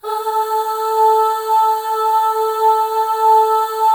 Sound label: AH FLAIR, FEM; Spectrasonics; FEM.2 AHS